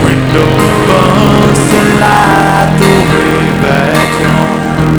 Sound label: hero soft filtered